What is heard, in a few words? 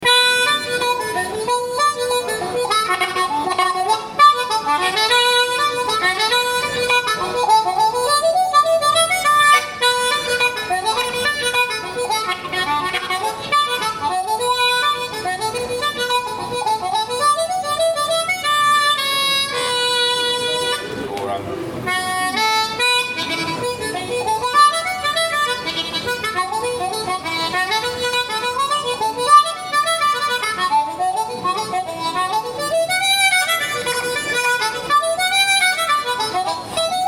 IrishTune; Вариация на ирландскую тему